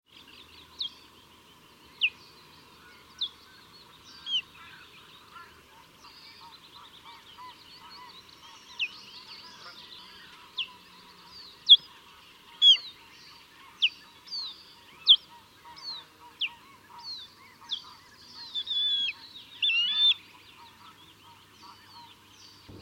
Fiskgjuse

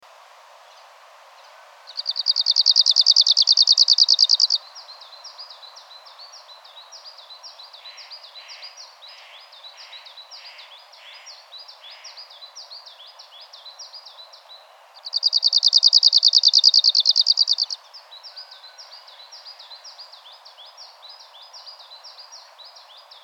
Nordsangare

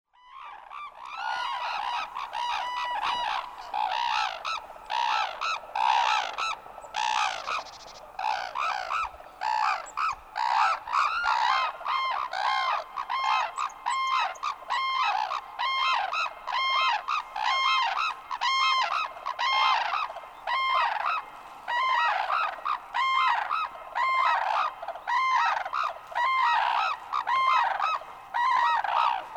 Japansk trana

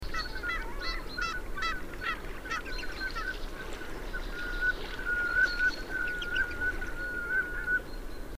Svarta

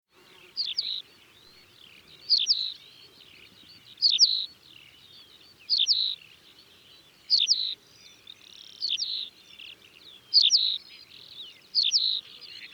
Faltpiplarka

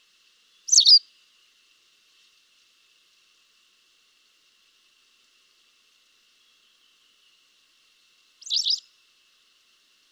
Sadesarla

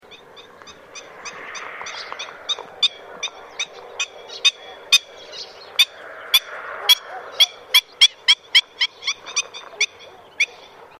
Styltlopare

